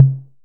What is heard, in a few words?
TOM 808, Elec. Percussion